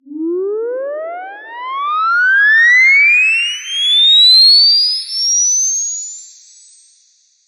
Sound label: high pitch riser sound